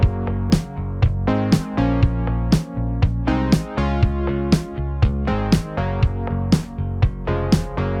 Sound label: loops, 120